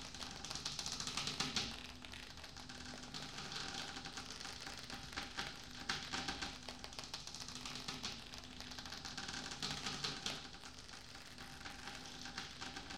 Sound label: Rope Scorpe; Game rip; MiSide